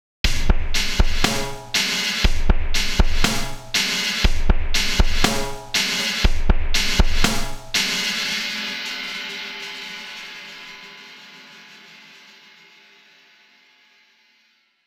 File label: drums